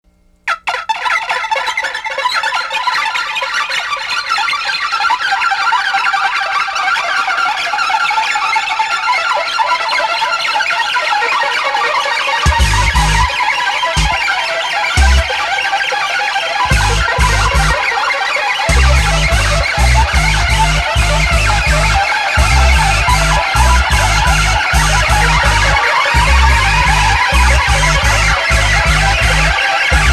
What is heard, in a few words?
district Noise; Sonicervex